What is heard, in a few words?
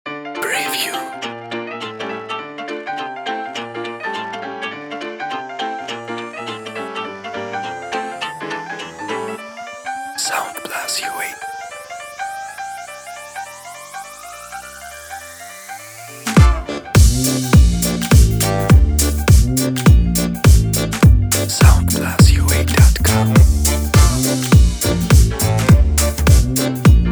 Electro Swing